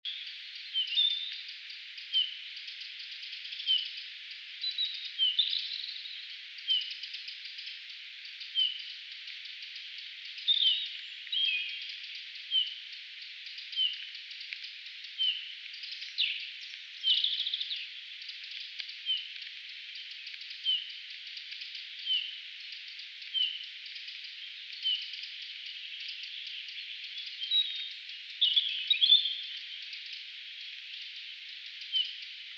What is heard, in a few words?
Pyrrhula pyrrhula; SETE